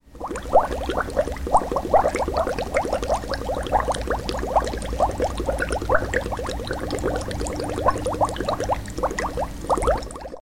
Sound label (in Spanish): burbujas